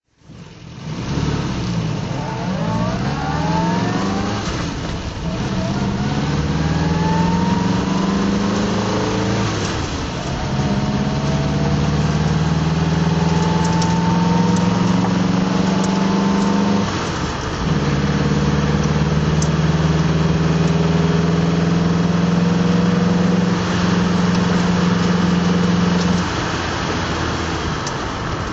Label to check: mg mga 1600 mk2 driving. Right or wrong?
Right